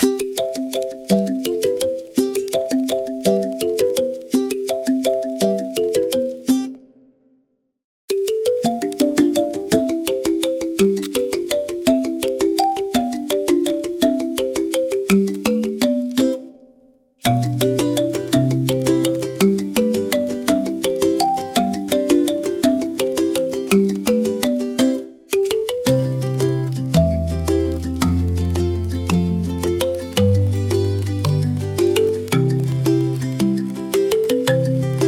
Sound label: kalimba marimba ukulele; African ensemble; Suno